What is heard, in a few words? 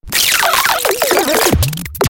Turntable Scratching Reverse